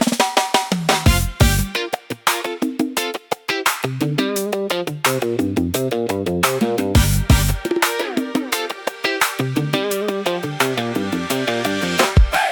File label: Bamboo Groove; beachy tunes